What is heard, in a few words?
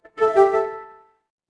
ui alert